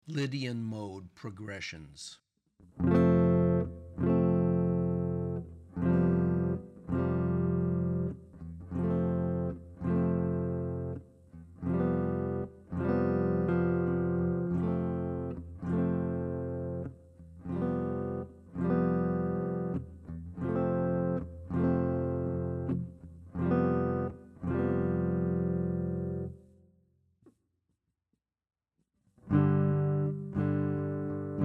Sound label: Modal Chord Progs Lydian; lead skills materials